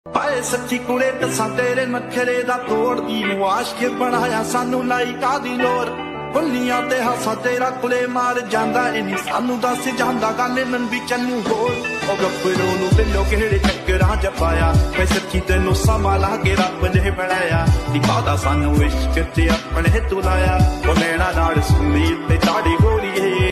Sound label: romantic song ringtone